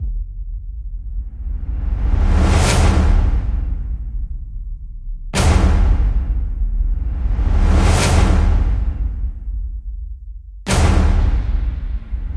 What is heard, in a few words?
Haunted House